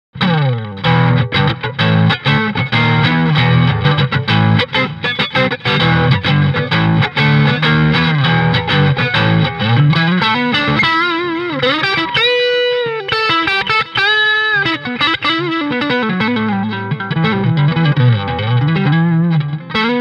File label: Stratocaster